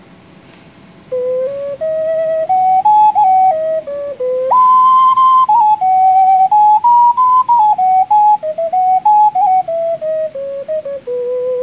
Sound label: ocarina